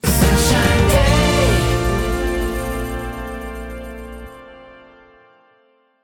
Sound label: Achievement Unlocked